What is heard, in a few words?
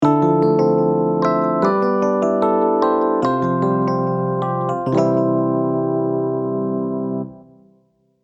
jingle